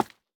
蜡烛破坏声

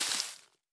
樱花树叶踩踏声

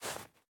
细雪破坏声

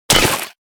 狼甲开裂声

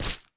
树脂破坏声

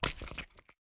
猫进食声

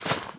根踩踏声

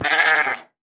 山羊死亡声

